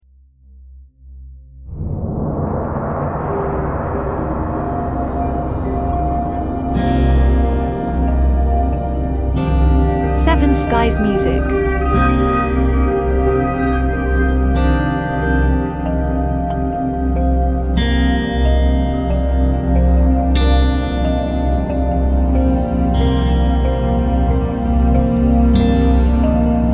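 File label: Ambient Reflective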